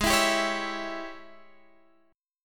G sharp Major 7th Suspended 4th Sharp 5th G sharp, down; strummed; G#M7sus4#5